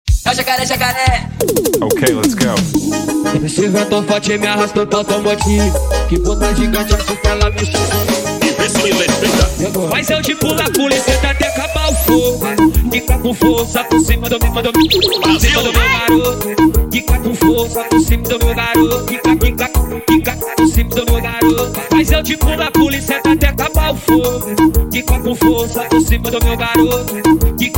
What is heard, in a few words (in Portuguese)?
forrozinho pra paredao piseiro